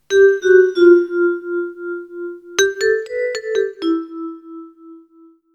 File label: vibra